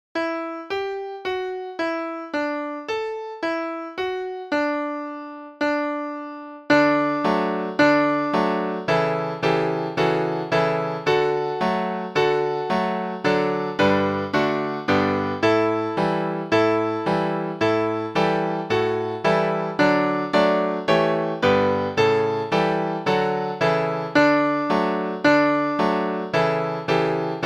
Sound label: can can piano